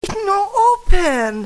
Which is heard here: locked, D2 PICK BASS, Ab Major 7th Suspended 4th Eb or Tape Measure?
locked